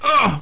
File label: npc pain